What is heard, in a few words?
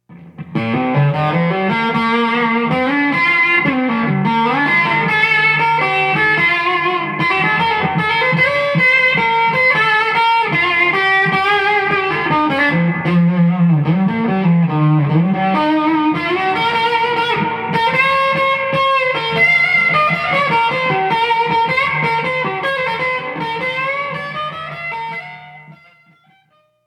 LP Blues Neck PU Moderate Grind Reverb